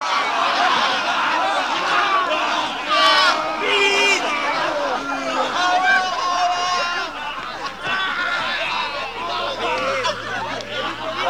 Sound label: human group noise; best service Blue Box; crowds interior